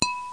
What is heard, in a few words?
ding